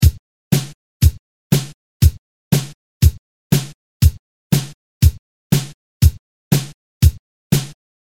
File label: 2 beat